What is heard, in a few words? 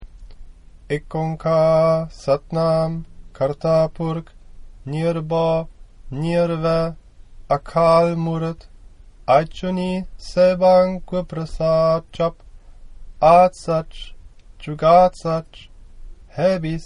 Mul Mantra